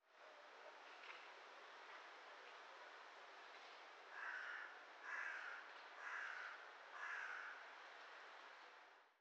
Bruit Bâton Et Corneille; Petite Ceinture

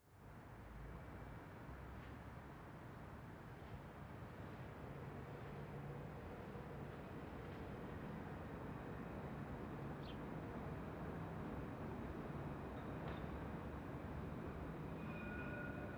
Tram Ding Et Un Oiseau; Petite Ceinture

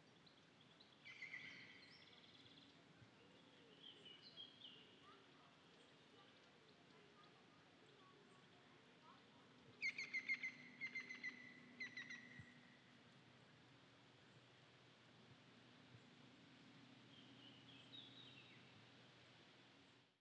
Très Ancienne Carrière Lac, Oiseaux Et Discussion Enfant Au Loin

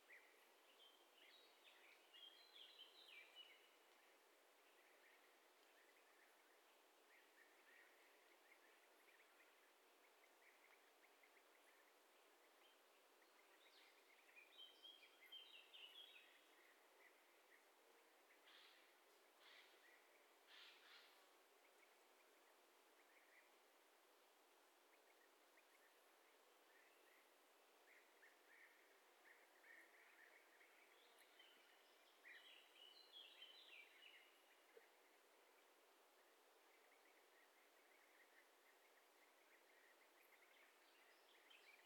Très Ancienne Carrière Lac Friche Bord Forêt Oiseaux Grenouilles

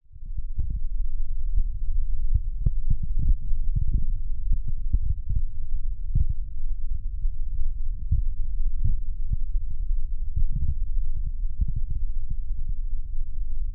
Petite Ceinture Grondement Tram

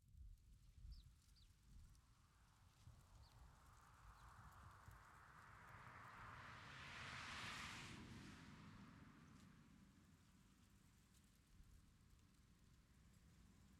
Friche Scierie Châtenois Voitures Route Pépillement

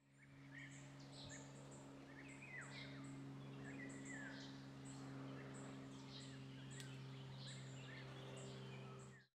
Très Ancienne Carrière, Avion Oiseaux

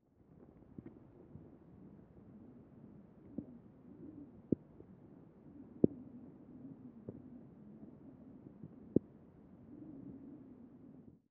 Arbre Contact Fourmi Homme, Friche Industrielle Rhinau

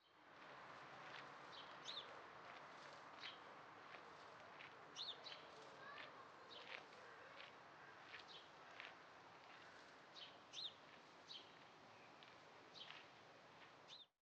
Pas Gravier Sachet Oiseaux Vent; Petite Ceinture

